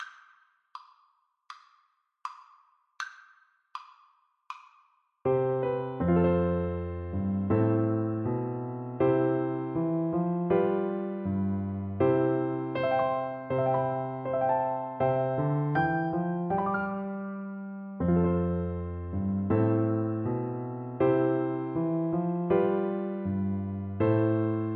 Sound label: double bass